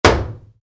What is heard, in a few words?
Wood hit metal, Wood, Medium Impact: Metal; Materials; Stuart Duffield